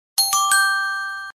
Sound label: quiz right